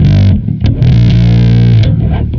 Bass, Distorted; Bass X

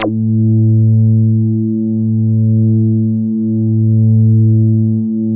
MINI JUZY A2, MINIMOOG; Big Fish Audio - First Call Vintage Keyboards

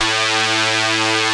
P5 GUITAR A2; E-MU Formula 4000 Series Vol. 5; Protozoa

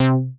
BASS C3; Best Service Dream Experience; R100M BASS

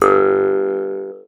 MT G, JHARP G B; Xsample VOL-9

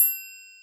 Triangle; Zero-G; Nu Disco

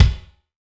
BD, BASS DRUMS; Drum Mashines; BASS DRUMS 2; EdgeSounds

